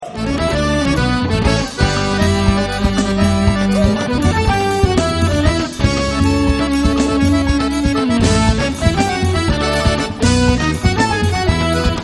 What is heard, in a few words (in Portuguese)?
forro